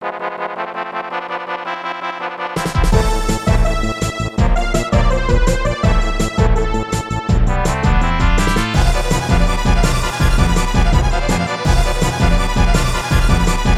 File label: battle normal